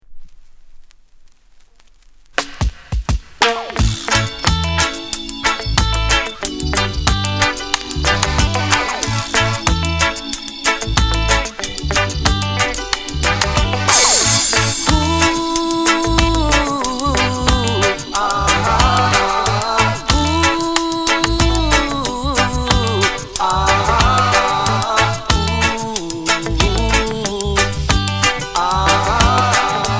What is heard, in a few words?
REGGAE MUSIC